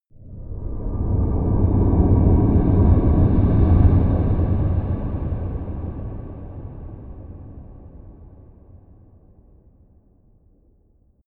whoosh rumble

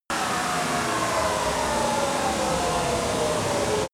crane winch spin down

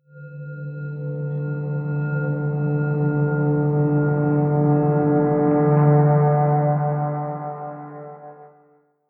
fading drone bell

